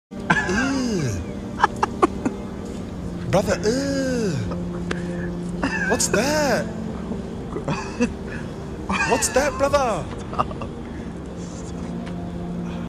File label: laughing brother ehh; Drake